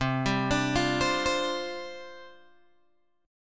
C Suspended 2nd Suspended 4th, down, Guitar, Open G